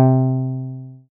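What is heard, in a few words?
pluck C3, 5U Modular; Polyend; Simulacrum Sounds; 1 Shots; Synths; Leads and Keys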